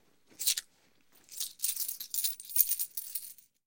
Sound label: Coins Jingle In Pocket; Coins Jingle In Cloth Pocket. - Jingling Coins